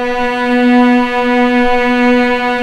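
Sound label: cellos B3, String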